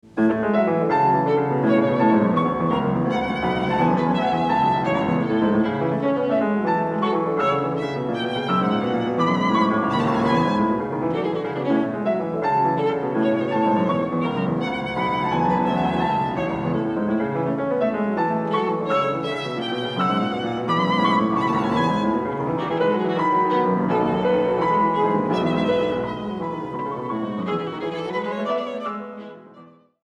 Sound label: Sonata for Pianoforte and Violin No. 6 in A Op. 30 No. 1 III. Allegro con; Beethoven; Variazioni